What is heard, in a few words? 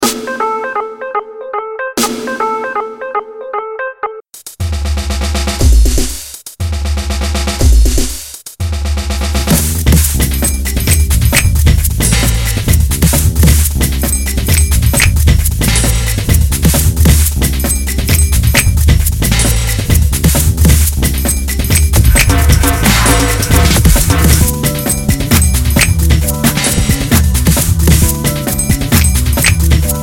Ring Tone